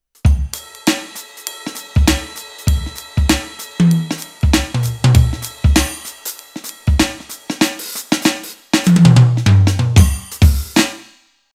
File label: Roland TD-4KP